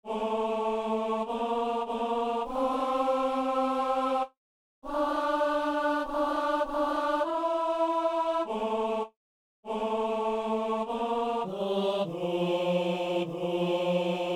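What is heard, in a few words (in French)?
quatre voix ténor; Dieu est amour; Alto (4 voix) / Ténor (4 voix) / Basse (4 voix)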